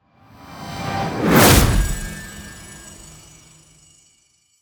shot light energy

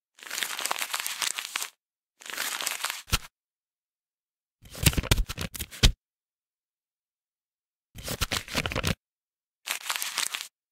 Paper, paper wrapping

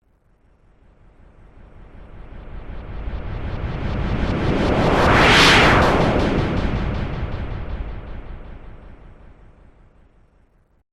Long whoosh

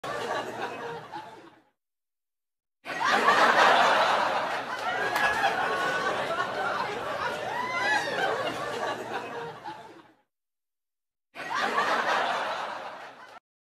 laughing

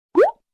Bloop Cartoon; goofy bloop

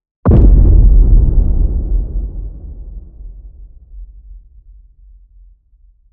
Universe Boom B; cinematic hit x

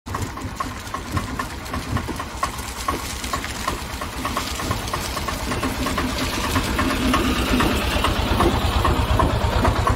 auto sound

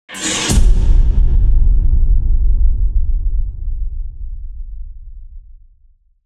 Subsonic, subsonic boom

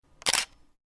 Shutter Click

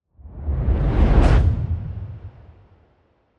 Low Quick

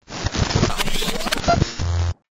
Rewinding

